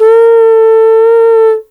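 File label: African horn A#4, Mozambique horn; Spectrasonics; Heart of Africa 1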